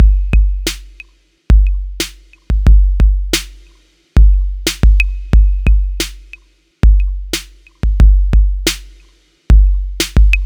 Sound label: Hector, loops